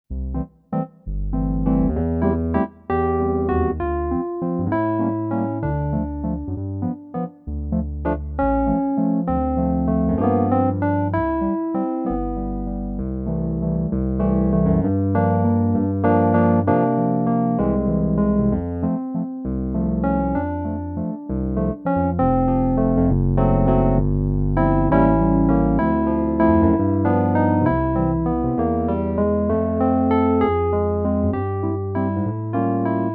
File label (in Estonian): jazz valss; standardtantsud